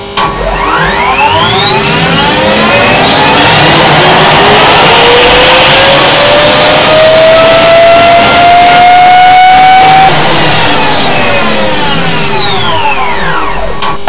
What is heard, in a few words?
AUX power, aircraft